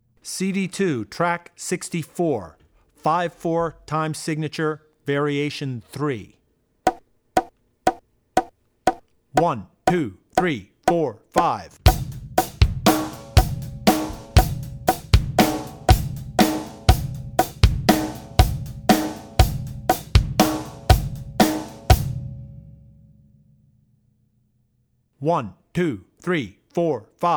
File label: Odd Time 5/4 Var; Drummer's Bible Tracks; Odd Time 5-4 (Variation 3)